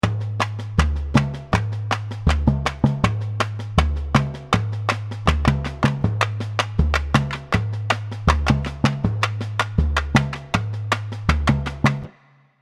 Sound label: tamborim break, samba; Pirate Samba